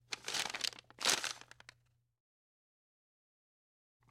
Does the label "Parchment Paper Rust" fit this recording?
Yes